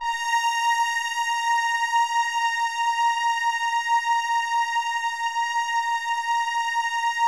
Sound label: A#4, Keypad; Standard Instr; Keypad 3